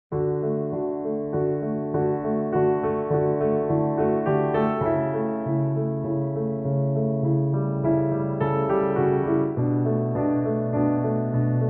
piano

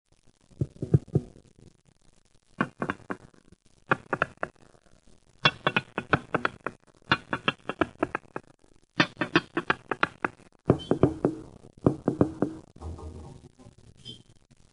Drone

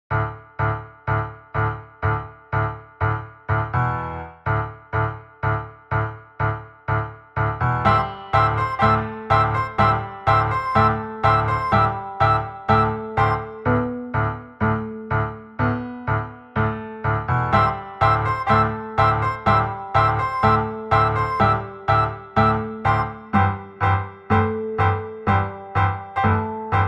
Piano and a Band

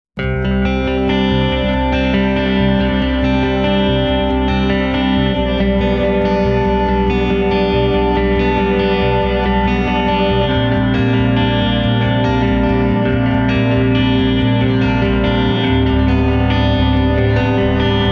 Dark Unease Descending riff guitars bass

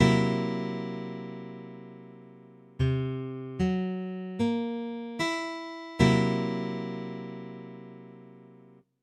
C7, Guitar Chords

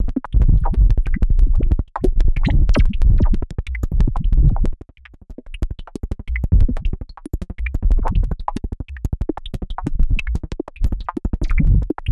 kick explorations

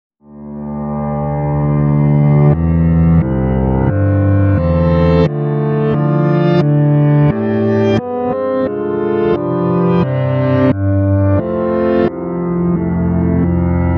Unease HurdyGurdy Backmask piano; CUES for Film TV Games etc SOLO PIANO - or mostly piano

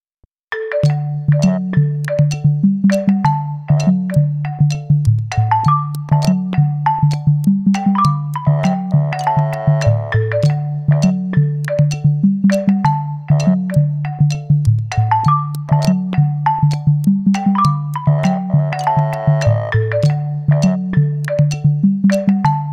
Tipsy Xylo

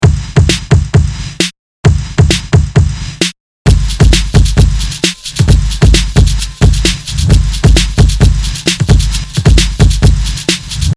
big beat; Loop Mania Sampler Pack

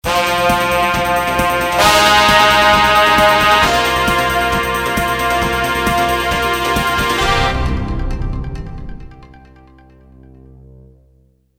Duo Fanfare News Jingle; Jingles for films podcasts

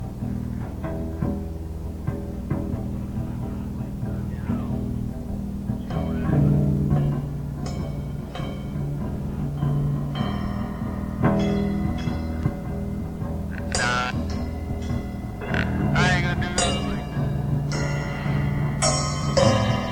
Jazz Intro

